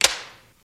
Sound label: Fire Stick Sizzle, muskets